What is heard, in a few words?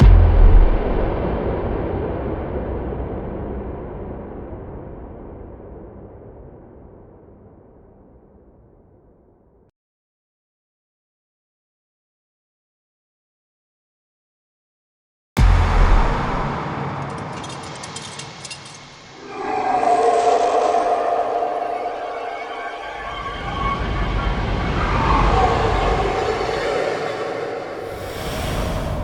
Gem SPFX; Lotus Gem; Warframe; The New War; Cinematics